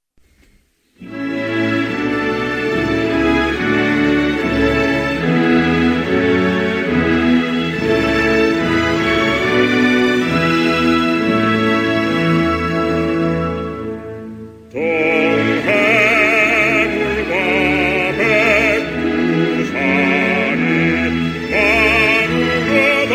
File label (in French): Coree du sud, Hymnes nationaux